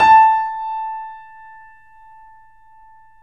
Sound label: A, Boesendorfer, 3D Pianos; E-MU Producer Series Vol. 5; 3-D Audio Collection